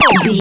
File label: retro laser gun shoot